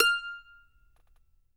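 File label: F6, Mandolin; Sampleheads; Whole Lotta Country 1 & 2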